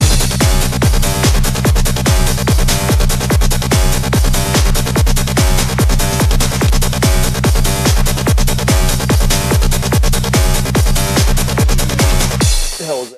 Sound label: Psycho Trance